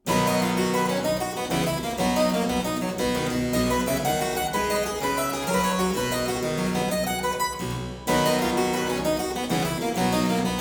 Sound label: Aria in C major - Variatio, harpsichord; Buxtehude; Complete Harpsichord Music; Aria 'More Palatino'; BuxWV247; Variatio 3